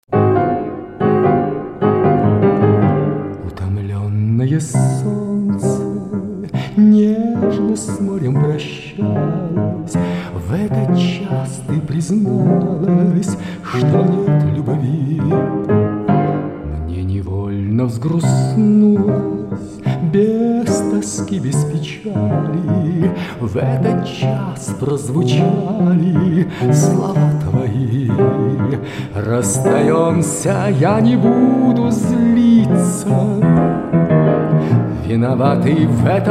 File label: tango